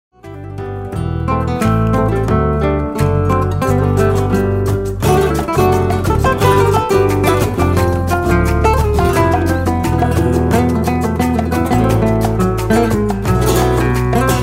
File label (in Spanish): Falseta, Flamenco